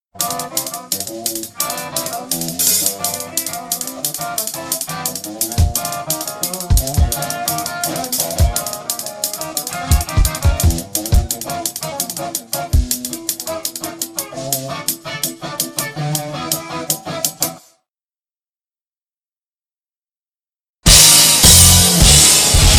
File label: drums